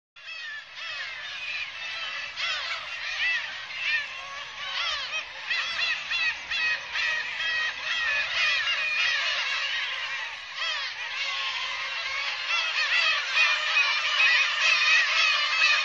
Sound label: Larus crassirostris; Sakhalin; Kuril; sea; priroda sakhalina i kuril